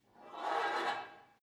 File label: take off weight barbell quick, fitnessstudio